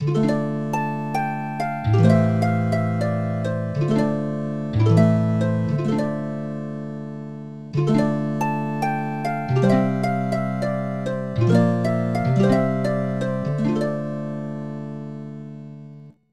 Harp; Dual Orb 2; SNES

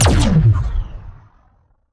Shot Large Blaster Warped Zapper

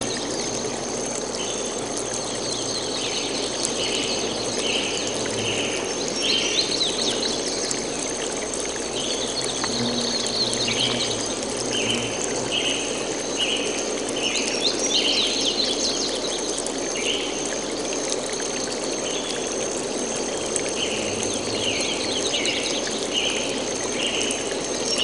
Jungle Bed; Civilization V

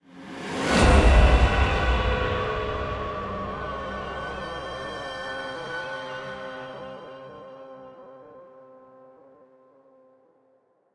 String; Middle-earth Shadow of Mordor

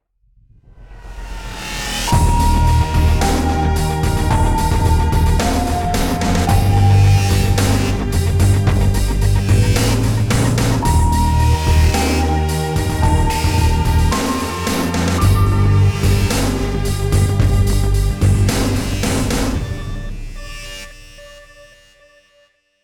beacon victory; crackdown

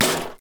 Bash Printer

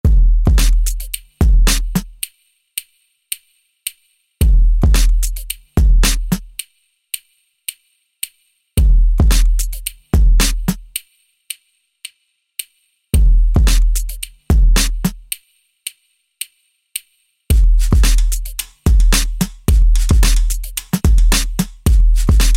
Hip Hop

